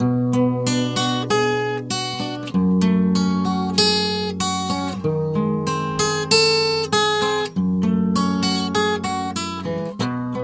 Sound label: guitar